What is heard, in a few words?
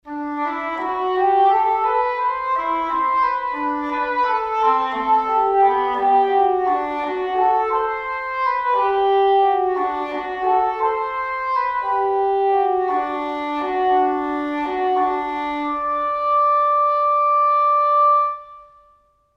Woodwinds; Berlin Woodwinds; Orchestral Tools; From the William Tell Overture: Ranz des vaches by Gioachino Rossini (1829).